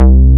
BASS, Synth Bass, Roland

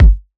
Kick, Kickdrum; Equipped Music; Loungin House

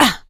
HIT, Jazz FX FEM PERC; Spectrasonics; Vocal Planet

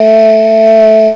AAHH; CMI Sound Library Rev 1.3; HUMANS

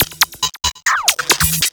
percussion loops 138; PS-45; Techno

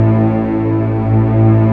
STR Orchestral Strings

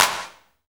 CLAP; Best Service; Dance Mega Drums; CLAP-HOU

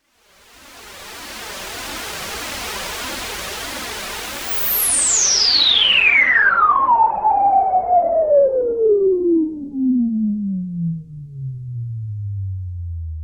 Juno Noise

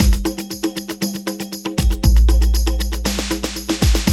Loop, Roland; Sound & Vision - Gigapack I

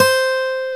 GTR C5, Dual Guitar, Roland; Club-50 - Foundations

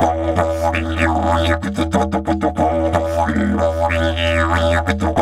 Didge 93 BPM, Didgeridoo

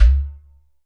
GHATAM

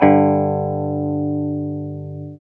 guitar 5TH

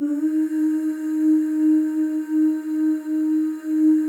OOSC, FEM OOS, Voices; Symphony of Voices; Spectrasonics; FEM.2 OOS

